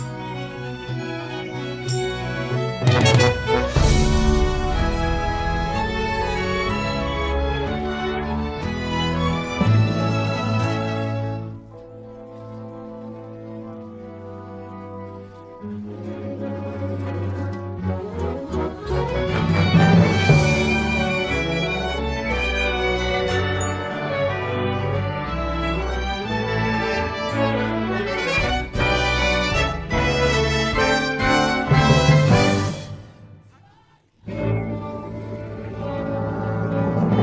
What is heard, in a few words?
Audition Track no vocals; theatre auditions